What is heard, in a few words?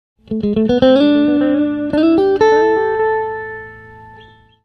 Delay